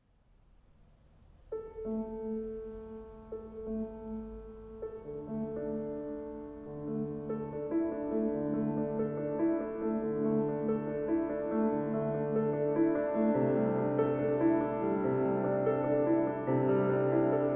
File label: Piano Improvisation